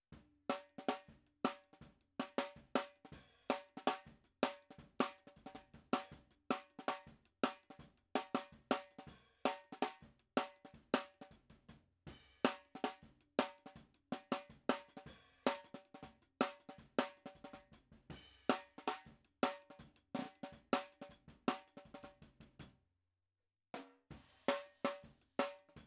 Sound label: SNARE, DRUM N BASS:JUNGLE BEATS; BLIND MAN RELAPSES INTO HARD BEATS TILL HE SEES THE LIGHT AGAIN; RAW MULTITRACKS